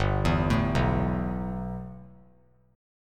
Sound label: G 6th Add 9th; G6add9 arpeggiated